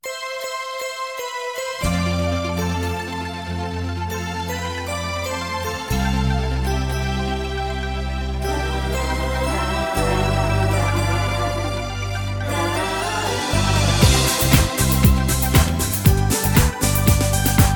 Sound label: SINGBACK, BULGARIAN